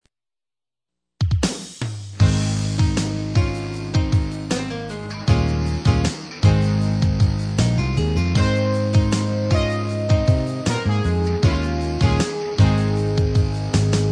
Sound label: pan meets guitar